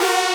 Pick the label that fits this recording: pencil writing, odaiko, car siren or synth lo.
synth lo